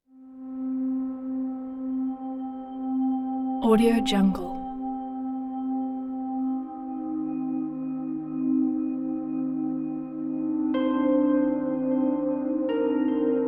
drama general dialog recollection

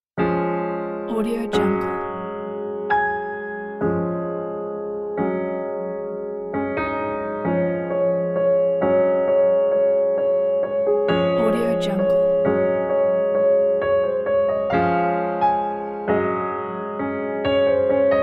optimistic piano

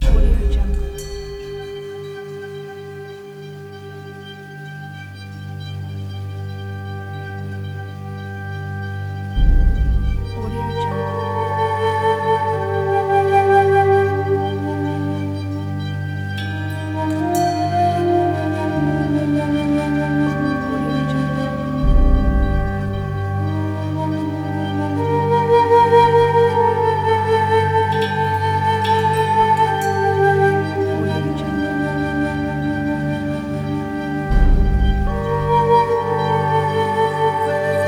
japanese ethno trailer ident

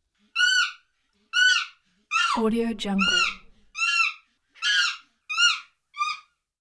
chimpanzee screams

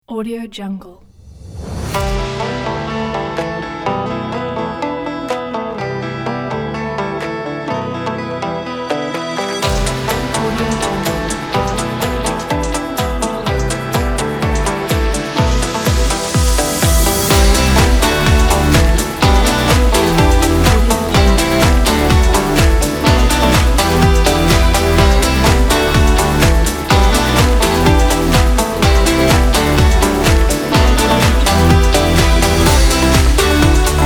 upbeat pop

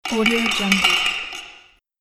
dungeon metal movement

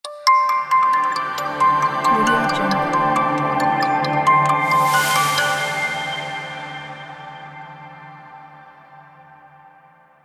clock is always right logo ident

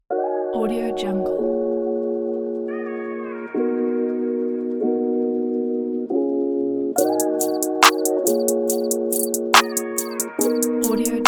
chill trap beat

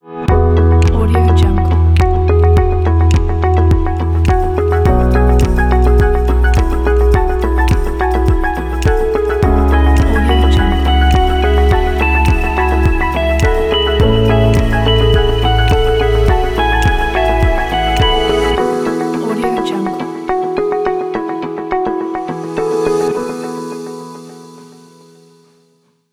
inspire calm ident

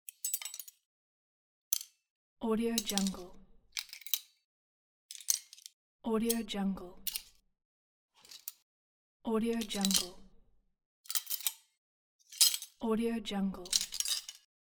cutlery

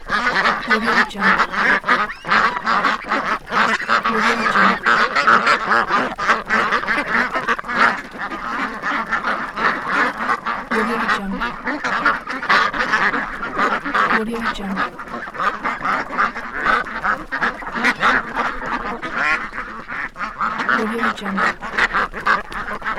ducks quacking

